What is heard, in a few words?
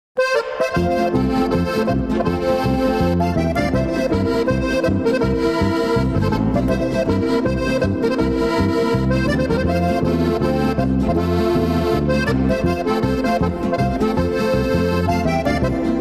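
tiroler buam polka, Steirische Harmonika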